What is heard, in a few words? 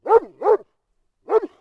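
dog; pes; Mafie 1 cz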